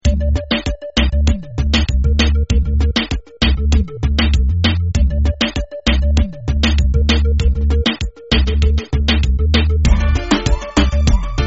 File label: Reggaeton